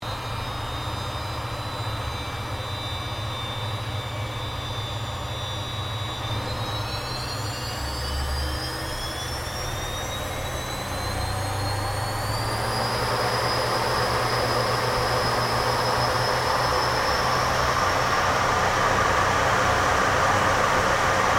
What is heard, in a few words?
AIRCRAFT CA-27 SABRE TAKEOFF INTERIOR; CA-27/F-86 Sabre; Airplanes